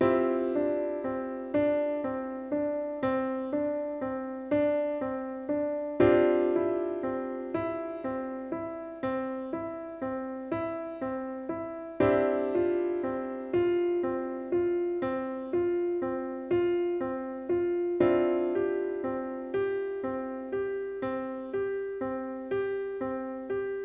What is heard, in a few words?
Hold-down Exercise